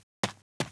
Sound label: RUN, Monster; Champion; 야수맹; 홍작후